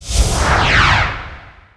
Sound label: object slide in; Earth and Beyond; EA Games